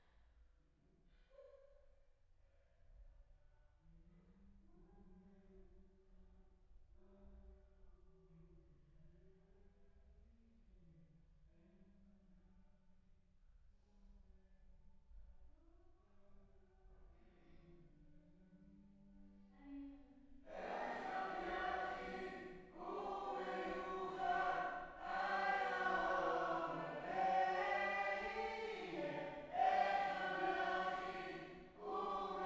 Choir

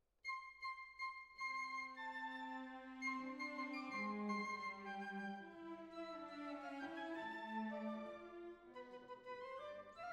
Violin Solo

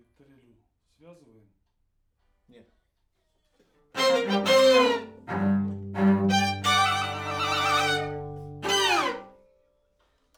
Main Strings

